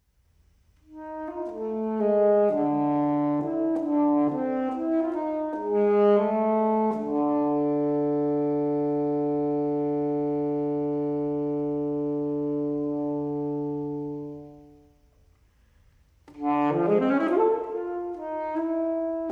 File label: Sequenza for Alto Saxophone; Berio; IX